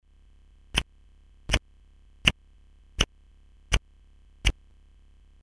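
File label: lighter sound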